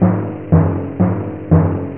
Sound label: timpani